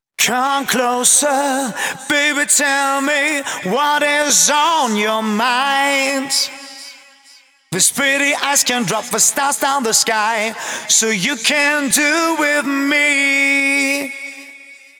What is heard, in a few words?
Male Vocals Vers WET, 128 BPM; Vengeance Samples; VTDS2 Song Kits